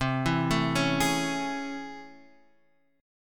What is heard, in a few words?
A Major 7th C; arpeggiated; JGuitar